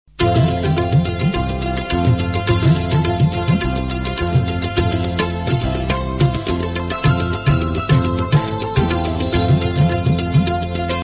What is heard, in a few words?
malayalam classical ringtone